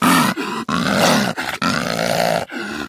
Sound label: mtushkano aggressive; STALKER; customnpcs